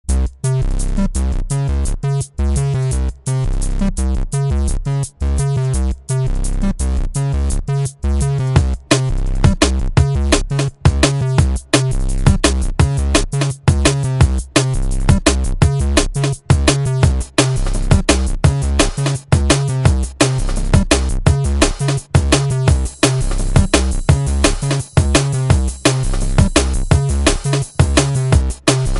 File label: funk explosion